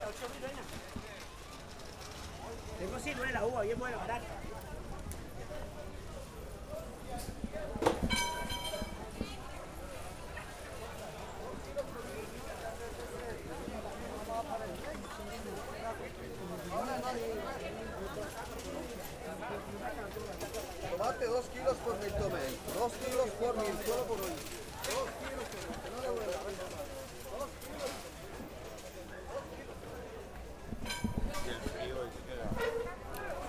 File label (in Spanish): Feria de Plaza viquez con carretillos